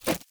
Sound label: Grunt Foley Throw; Enemy; Characters; Corrupted Sproutfolk